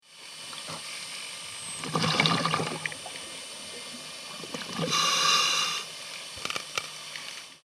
Unter Wasser Atemluft

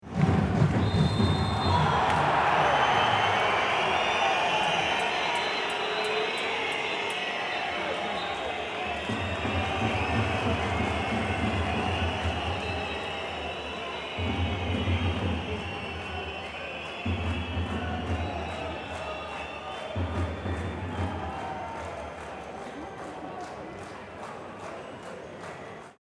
Handball Hallen Spiel Atmosphaere

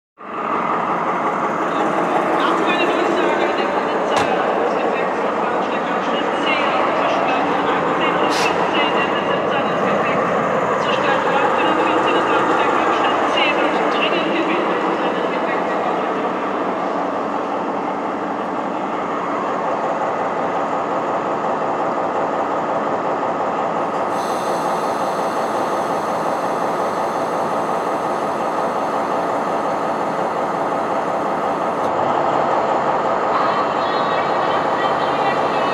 Bahnhof Diesellok

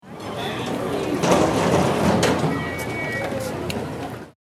Schiff Aufgang einholen

